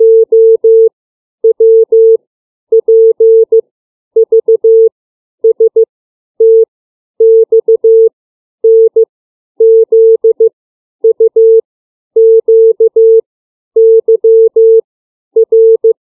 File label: CW alphabet